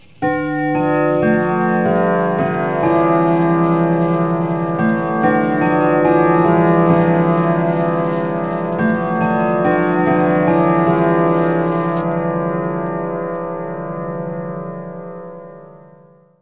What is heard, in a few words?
Winchester 1⁄4 Till, Chimes; Household